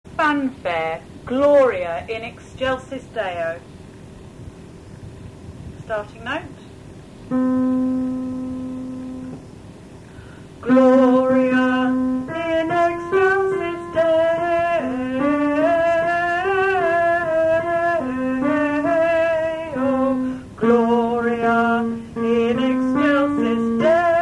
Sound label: fanfare